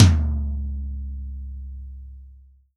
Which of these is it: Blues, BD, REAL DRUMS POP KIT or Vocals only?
REAL DRUMS POP KIT